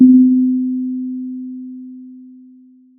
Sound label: Sound of a church bell